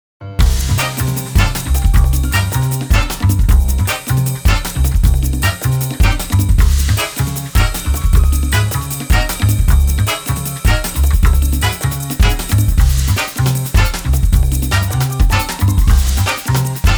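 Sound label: Results Display Screen; Super Smash Bros. for 3DS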